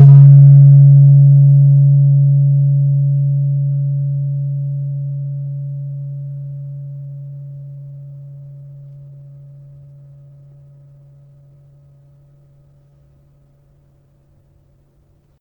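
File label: Slentem D3, gravity harps tuned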